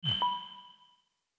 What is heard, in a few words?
transition